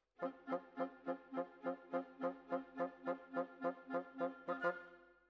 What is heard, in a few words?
Bassoon, Jazz for piano